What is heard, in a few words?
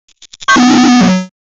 video game negative sound